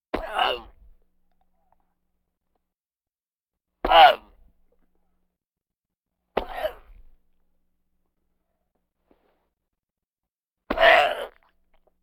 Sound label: Vocal effects breathing Moaning Baby